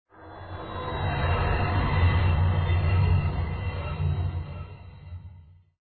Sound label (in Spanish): suspenso